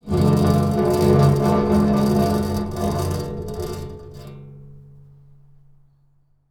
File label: Pane Of Glass Scrape